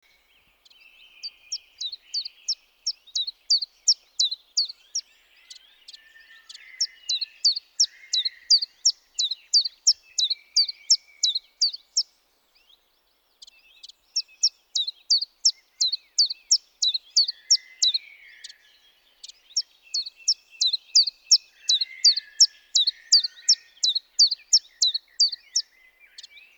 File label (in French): Pouillot véloce Phylloscopus collybita